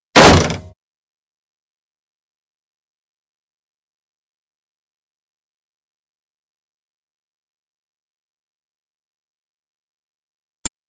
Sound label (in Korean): Fights, 싸움-전투; 헐리웃특수효과음